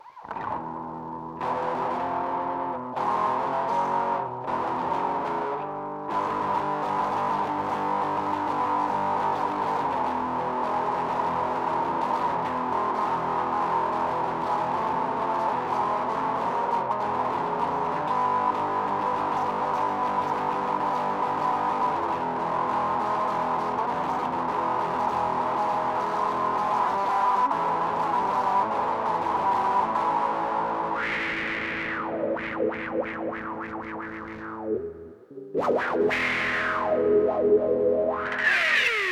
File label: electric